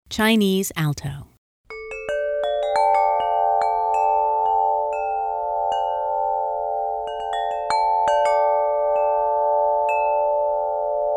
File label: Chinese Alto